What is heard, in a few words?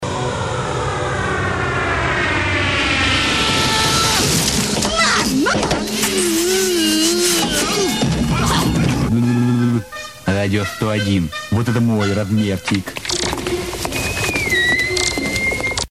jingle